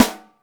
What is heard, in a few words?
ULTRA THIN, SNARES; Back In Time Records; Big Bang